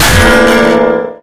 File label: melee guitar; weapons; Left 4 Dead 2